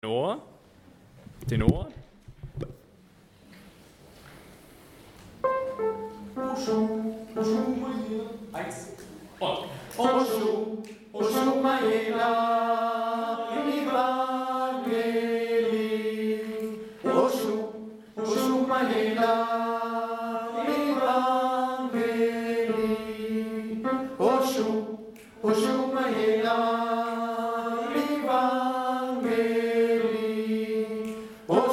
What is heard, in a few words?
Tenor